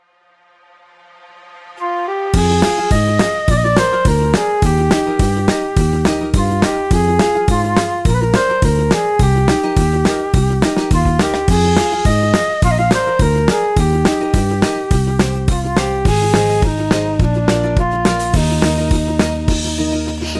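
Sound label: song; pesnya dlya malyshei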